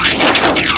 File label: Pulse Fly; Monster Hunt